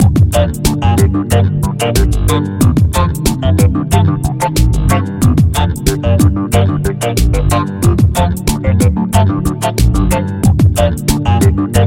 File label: alien groove